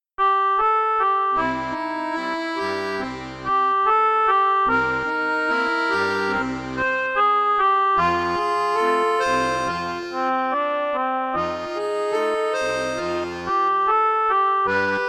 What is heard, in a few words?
Good bye Blues Duet